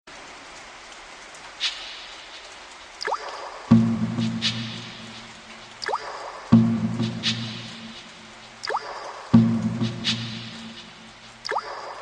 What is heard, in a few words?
Rain music